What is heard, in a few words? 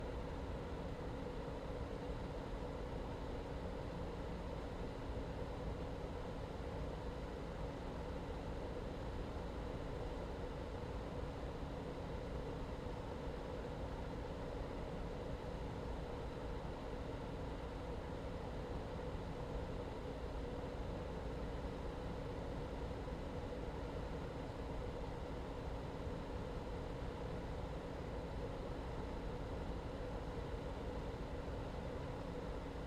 Ventilation Fan, small box, metal, electric motor, disconnected, whirring, spinning, low med intensity; loop; Eiravaein Works; Vaeyan IV